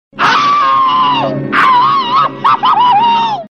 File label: Tom Cat Screaming